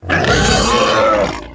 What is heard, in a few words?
Attack; Helboar